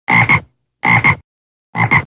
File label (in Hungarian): béka